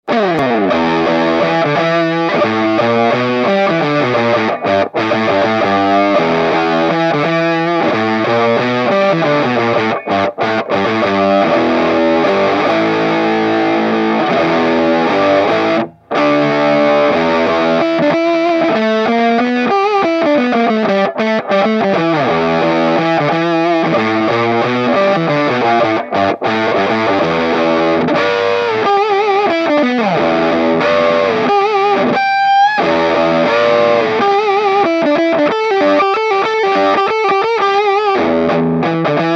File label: cosmos hi gain